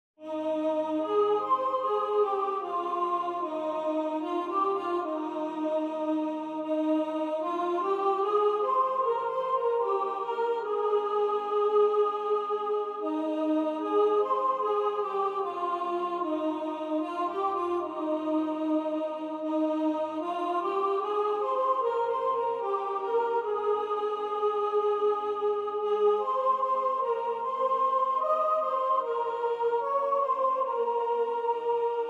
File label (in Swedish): SOPRAN; Bereden vag